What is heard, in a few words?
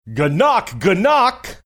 gaknock gaknock